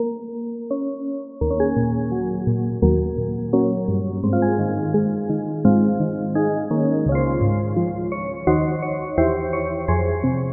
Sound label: Key; Routed Sound; Serum 2 Expansion - Story Walker Vol.1